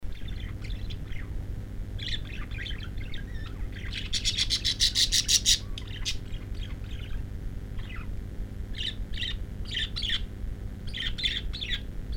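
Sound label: volnistyj popugaj golos, budgerigar voice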